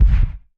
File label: damage hits explosions, Warships